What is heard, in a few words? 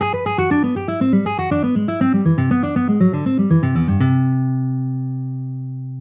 Legato and Hybrid Picking Workout, guitar